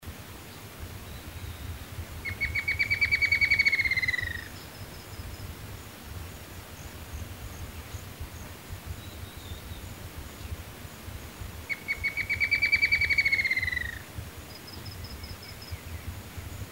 canto do arapacu de bico branco

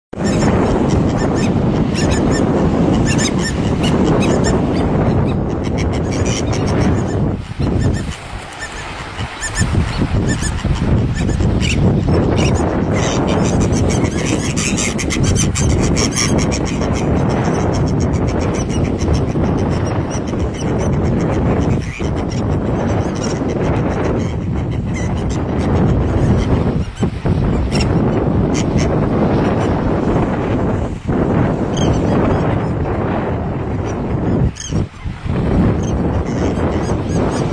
Canto da garajau de bico preto

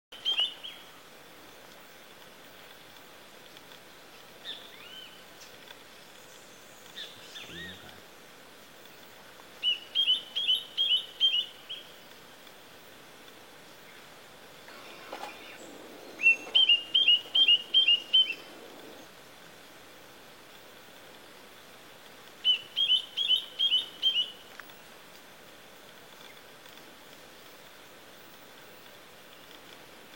canto do chororo pocua

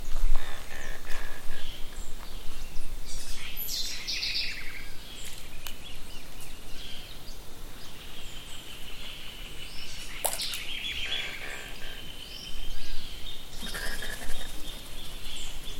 Biguatinga Canto

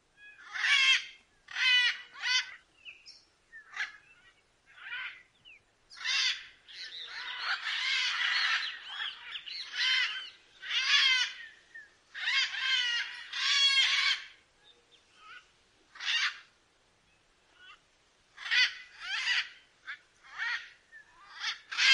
canto da arara de garganta azul

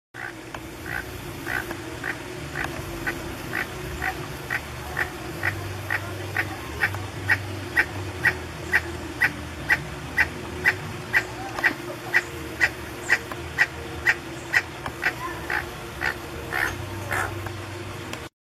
Canto do tucano esmeralda

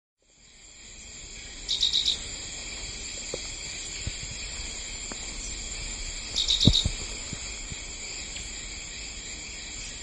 canto do gaturamo preto

